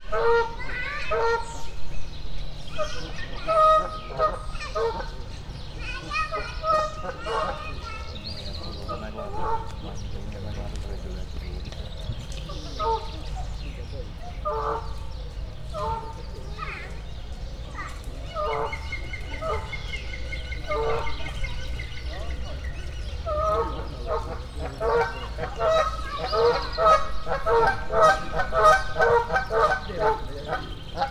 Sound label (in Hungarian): megszólal a zöldküllő is, kanadai lúd; debreceni zoo 2012